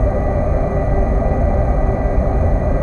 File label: engine; Earth and Beyond; EA Games